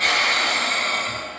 Air High, train; OpenBVE

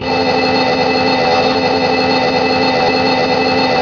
VACMA down, Train; OpenBVE; Carmillon

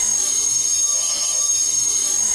Flange, Train; EWII BDt+7EWII; OpenBVE

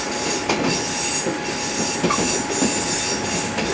Flange, Train; OpenBVE; 103KCJ-LowerCab

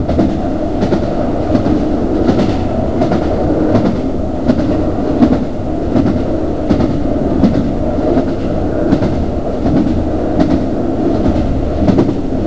run, Train cl158; OpenBVE

